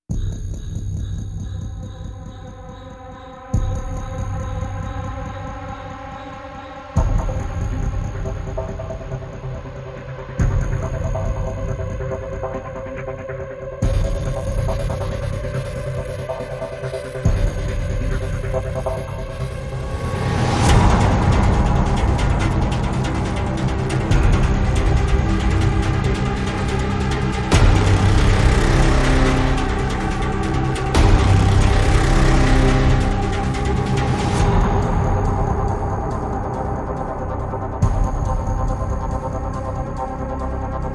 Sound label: No Strings